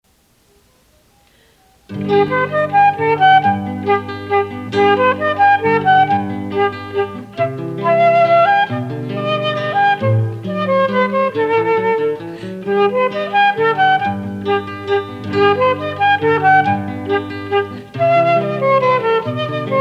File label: Guitar